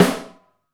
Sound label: DEEP ROOM, SNARES; Back In Time Records; Big Bang